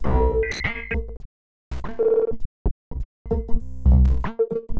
Glitch Loop (100BPM); glitch kit; Misc Loops

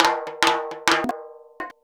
100 TIMBA, Modern Percussion Loops; Soundscan vol.56; AKAI

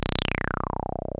303 REZ; Pro-Rec - Synthsonic

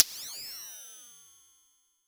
fm fx; Roland MV8800; MACHINE KIT1

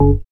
SYN, dance; Sound & Vision - Gigapack II

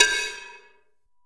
FX BELL, PERCUSSION; AKAI S6000 CD-ROM - Volume 5

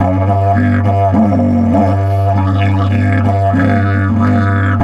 DIDGE 100BPM, Didgeridoo

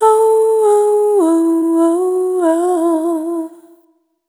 Muse, Vocal Samples, Single Voice Melodic Loops; Singles

